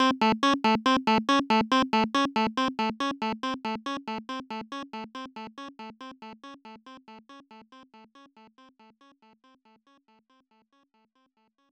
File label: Boss BGM, X68000; TwinBee